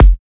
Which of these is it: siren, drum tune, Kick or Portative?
Kick